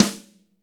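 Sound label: snare drum, acoustic drum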